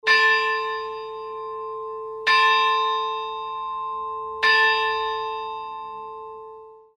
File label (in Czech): zvon cyril a metodej; zvonohra